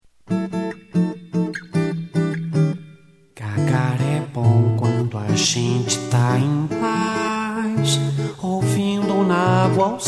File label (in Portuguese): musica para banheiro